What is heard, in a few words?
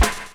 LOOP; X-Static Goldmine 2